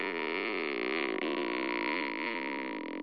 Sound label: Creaky Door, cheesy lo-fi